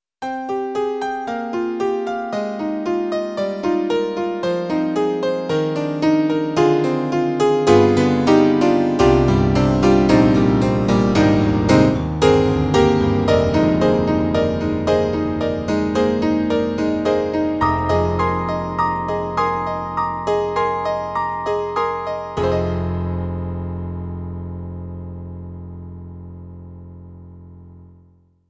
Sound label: Piano; Roland; SonicCell; JD-800 Piano